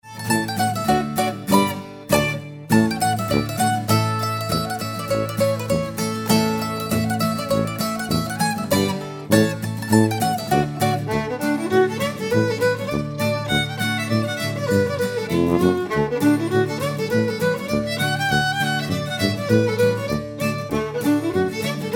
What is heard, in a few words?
Kissing Polka